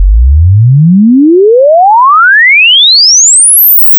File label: sweep long